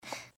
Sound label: breath; pony; Twilight Sparkle